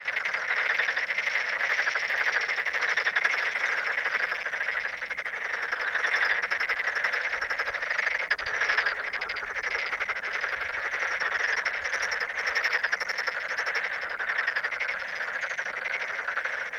toad

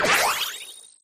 decision, button

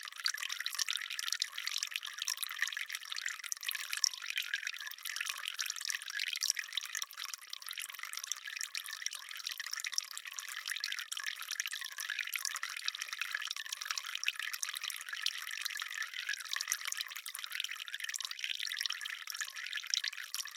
water shed

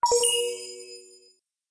news title

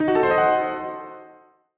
anxiety piano, anime

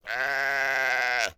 sheep cry

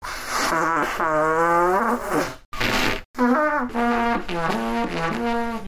trumpet dub

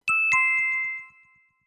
correct

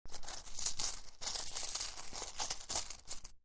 purse in

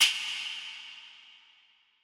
fingersnapping

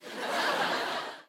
people studio laugh normal

